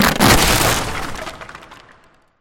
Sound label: wood beams crash; scripted sequences; distillery